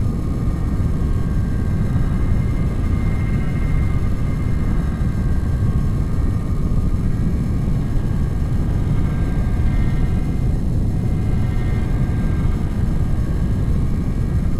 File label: int digital pit; Titanfall 2